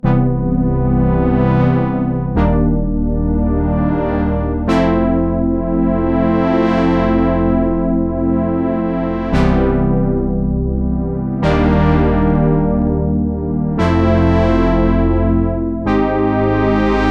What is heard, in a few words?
brass pad full; ambika